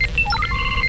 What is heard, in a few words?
noise; Herois